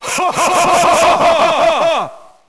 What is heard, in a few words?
hohohoho